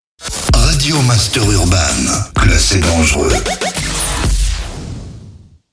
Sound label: JINGLE RADIO; RADIO MASTER URBAN; Habillage